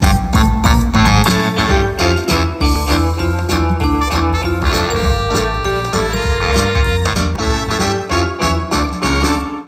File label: stream